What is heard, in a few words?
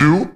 Vocal Oneshots; Vengeance Samples; Vocals